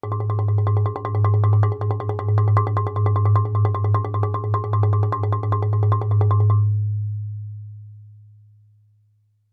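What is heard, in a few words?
Marimba roll fortissimo Ab2; MIS Pitches - 2014; Percussion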